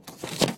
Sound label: Homescreen Remove; G3 D855; ui; LG